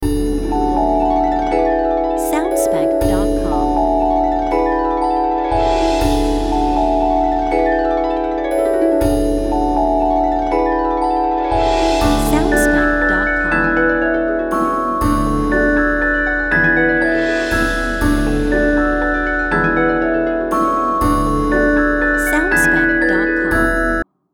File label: Fairy Tale Fantasy short loop